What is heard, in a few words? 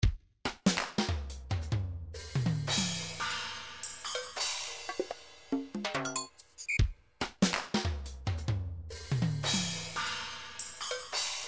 142 wavedrum, midi